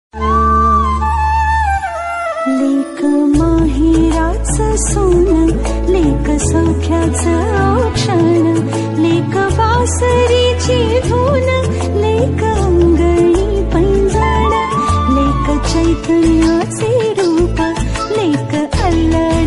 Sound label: marathi song